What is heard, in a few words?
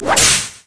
double strike